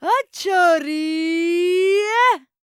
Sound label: VOICE, EASTERN FX; ILIO; Vocal Planet